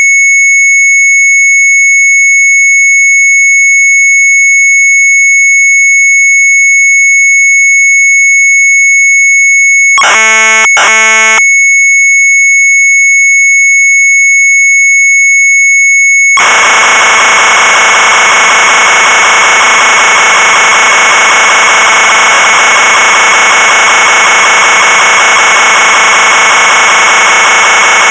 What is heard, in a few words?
MZ800 Tape, sharp; REUTERSVARD 2; retromelsarchive